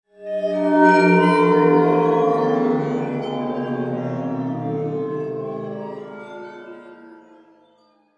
Water Phone

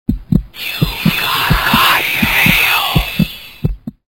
Mail

